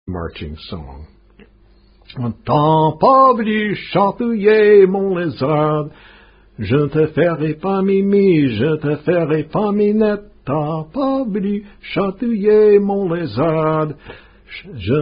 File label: Unknown & Unusual Bawdy French Canadian Marching Song; rob willis collection